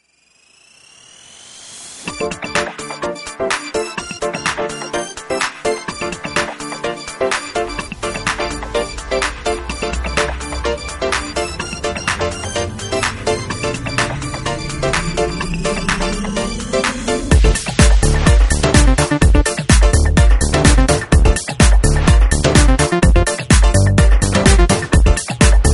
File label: INTERCEPTION HOUSE showopener